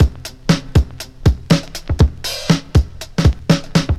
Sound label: snappy, breakcore Breaks